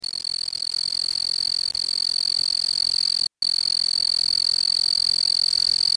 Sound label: bug; nakaniwa; keisoku